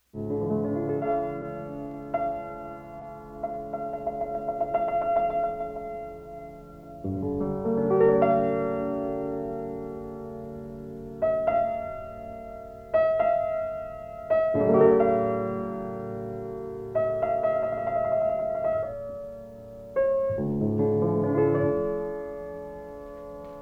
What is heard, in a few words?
Prayer